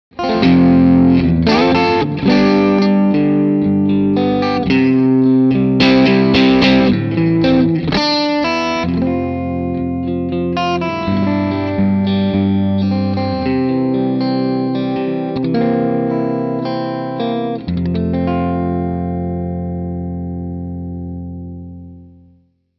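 Single Coil Overdrive, Crystal Overdrive, chitarra elettrica Fender; neck + middle pickup; Modalità: Overdrive; OD Drive: 3/10; OD Tone: 6/10; OD Volume: 3/10; Clip 2 – Crystal Overdrive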